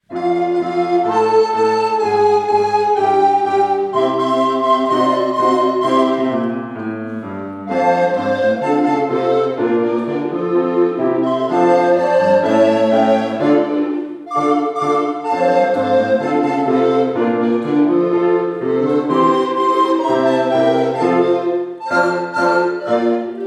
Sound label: Baroque Roll